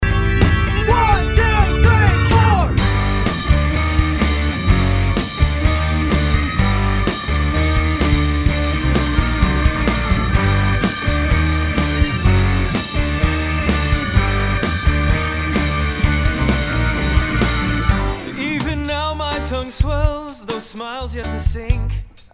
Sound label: Tango